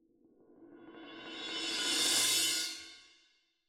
Cymb Close

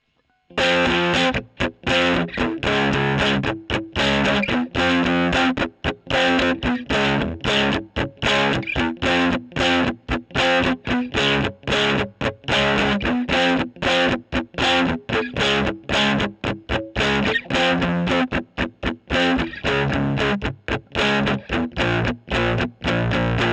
E.GTR Gefell

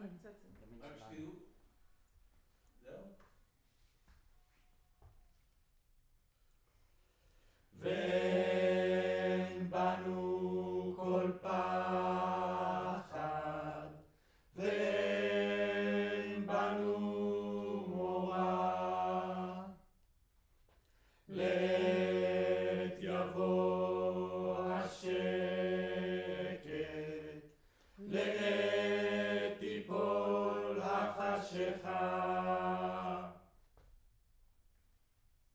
Room, Choir

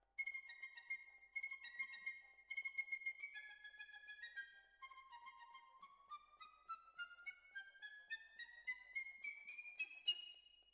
Clarinet

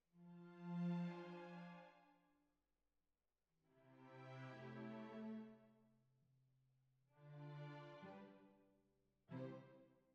Cbasses

